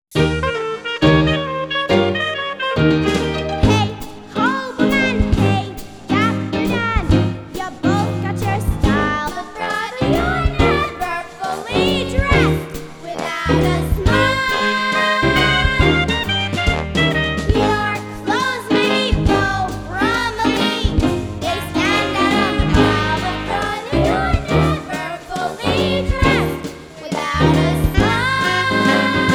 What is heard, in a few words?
Children Guide Vocal Version